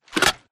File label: wpn r101 reload magpull; Titanfall 2